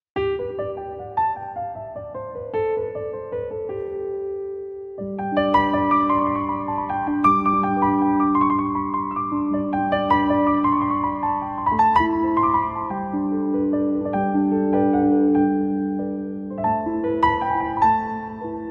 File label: Piano Violin